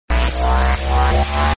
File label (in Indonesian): ringtones lucu ringtone gokil ringtone aneh